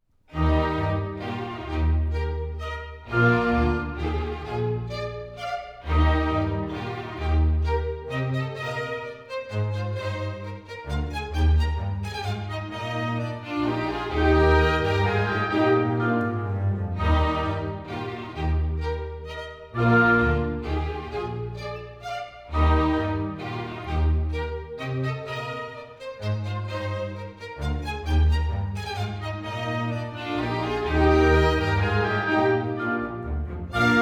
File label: Menuet in E flat; Mozart; Serenades-Divertimenti-Dances